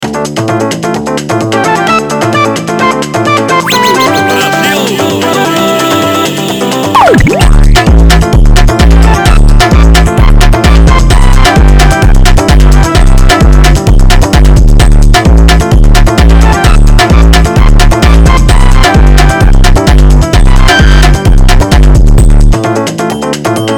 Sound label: funk